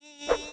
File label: yellow jacket; The Interactive Alphabet